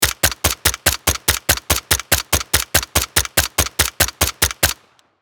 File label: Slow firing machine gun long burst